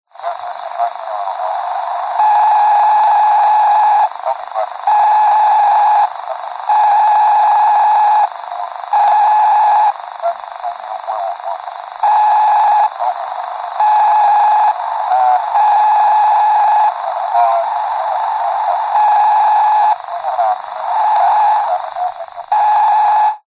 technology walkie talkie radio interference faint male voice